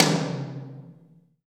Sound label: acoustic tom drum c key